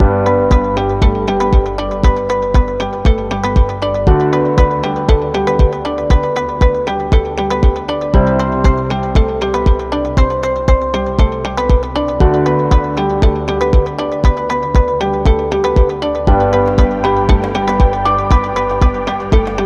Elegance; educative

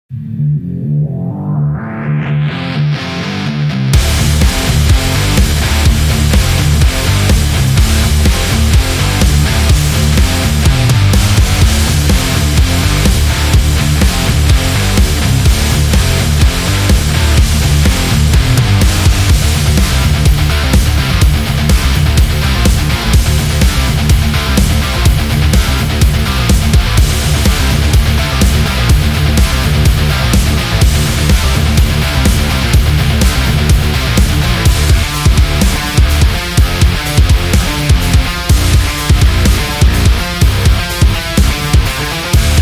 Hard Rock Heavy Metal

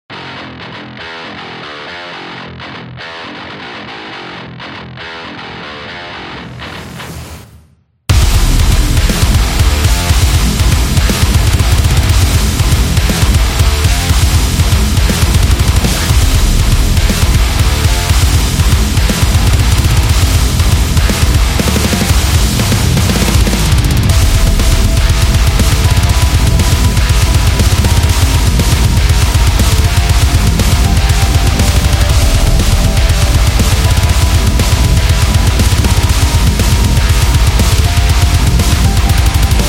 In Heavy Metal

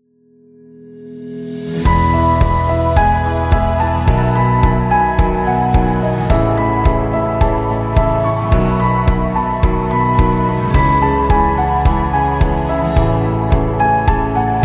Acoustic

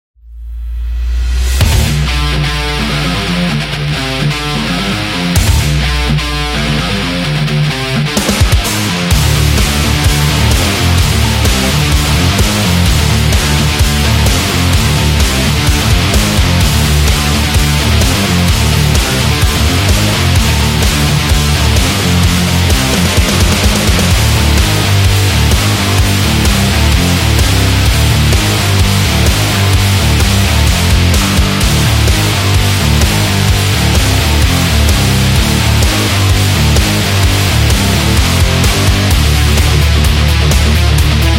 Angry

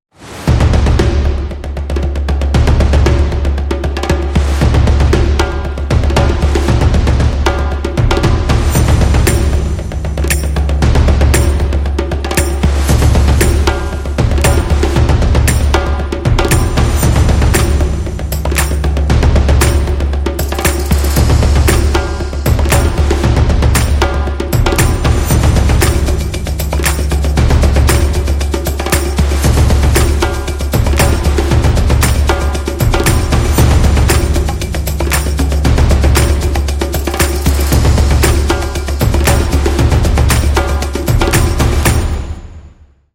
Percussion Ethnic Beat; amazon drums

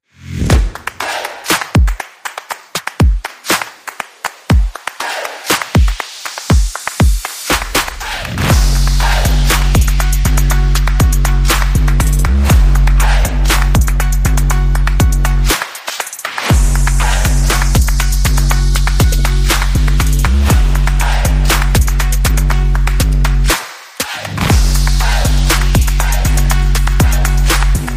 Stompproof

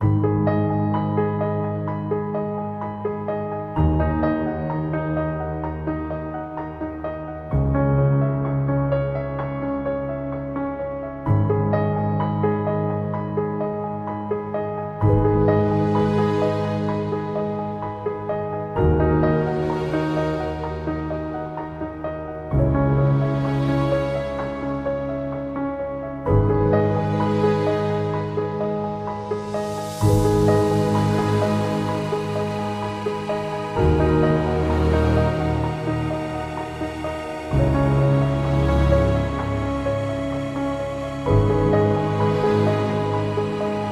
Sad Piano

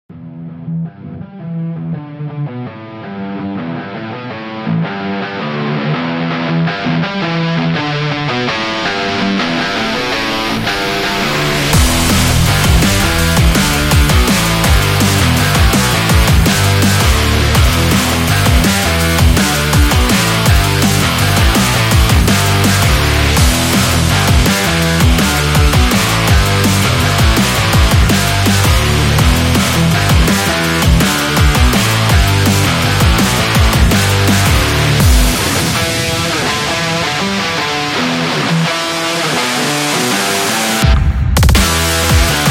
Sport Breakbeat; sports training energy

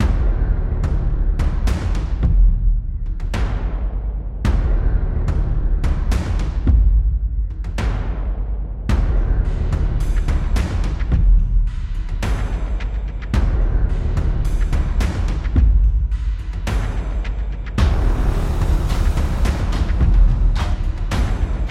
Tribal Drums

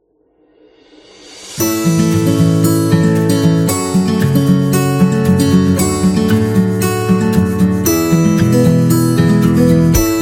Product Ad

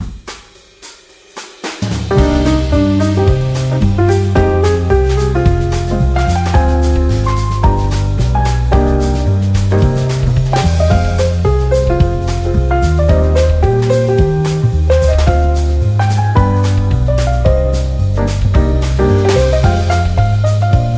Restaurant Jazz

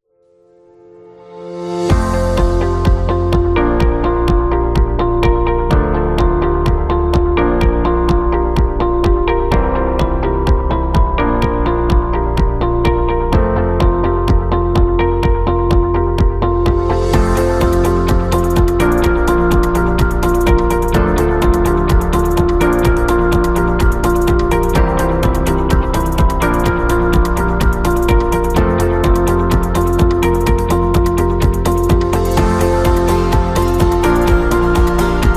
Corporate Presentation; corporation

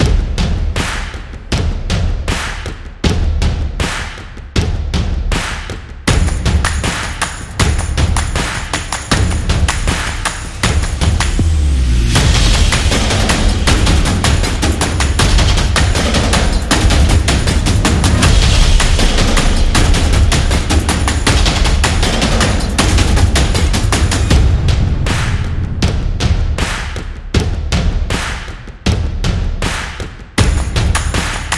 Epic Football, sport drums

